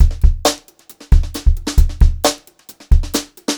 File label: beat, Brushed Drum Loops; USB Soundscan vol.39; AKAI; 90 s Sample CDs; 133 HOTROD